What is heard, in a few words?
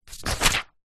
notepad page turn thin paper a4; foley